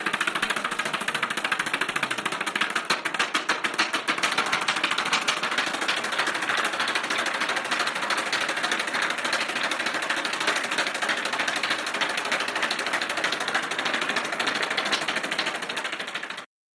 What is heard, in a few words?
helicopter; soundscrate